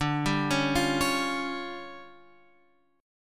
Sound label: D-Minor Major 7th Double Flat 5th down Guitar Open F, DmM7bb5 chord; arpeggiated; JGuitar